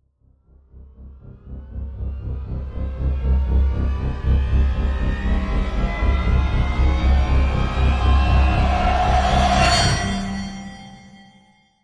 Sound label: Riser